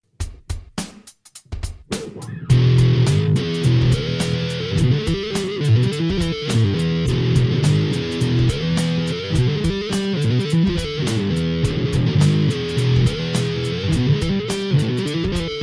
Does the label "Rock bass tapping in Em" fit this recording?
Yes